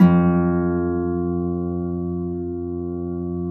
F1 HARP; USB Soundscan vol.62; AKAI

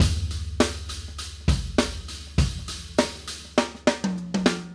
Acoustic Live Drum; Platinum Audiolab

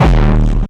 HD BD, Hard & Loud Techno; USB Soundscan vol.01; AKAI

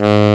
G#1, TENOR SAX; AKAI S-Series CD-ROM Sound Library VOL-7

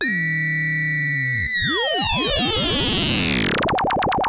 Moog C3; Hollywood Edge; Giorgio Moroder Rare Synthesizer Collection; MLTI.MOOG

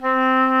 WOODWINDS

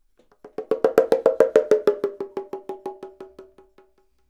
AMB CONG, AMB PERCS; Univers Sons; Basicussions

